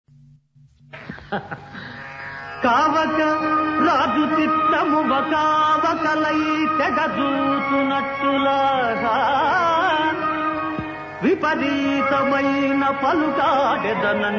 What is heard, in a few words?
Padyam; Saarangadhara